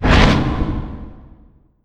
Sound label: blink in; weapons; persona; dota; antimage